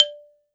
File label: BALAFON; Best Service ProSamples vol.52 - World Instruments 2; AKAI